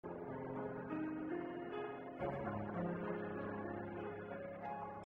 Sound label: piano